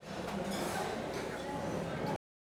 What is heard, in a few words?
busy dining room, European Ambiances; 3maze